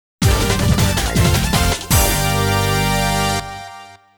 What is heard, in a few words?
Boss Victory Jingle; rockman x command mission